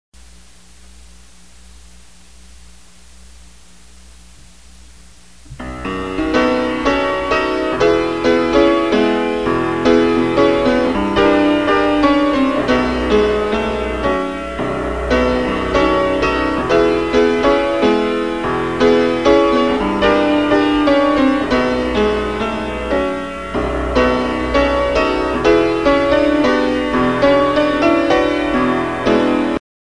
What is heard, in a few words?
PIANO